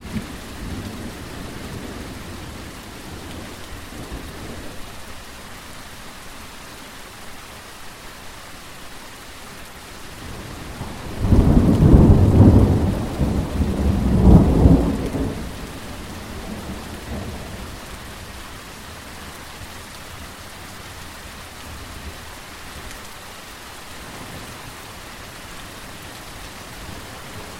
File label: thunder, bowen island